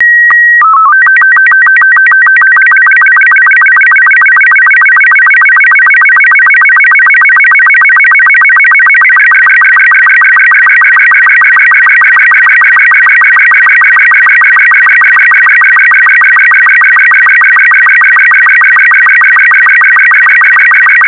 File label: the glitch